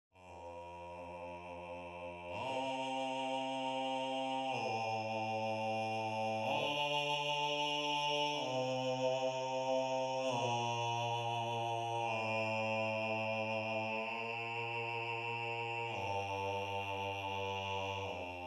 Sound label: Bass